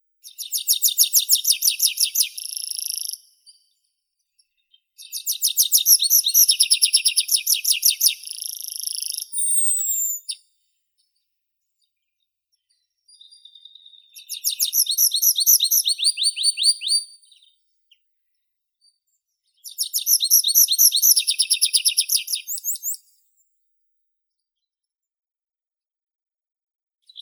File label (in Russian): konek lesnoi anthus trivialis